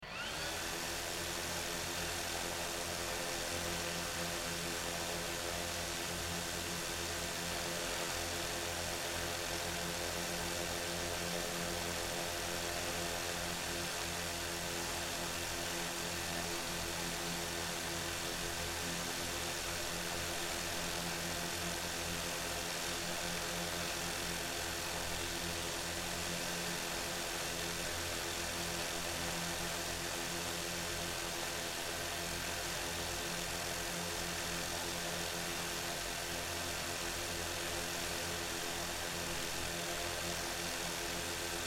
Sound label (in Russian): zvuki kvadrokoptera drona